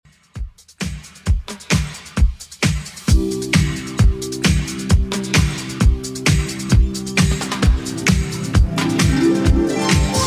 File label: 70s Redrum 132bpm